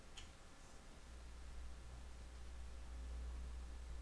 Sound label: glass